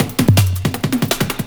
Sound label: breaks, 162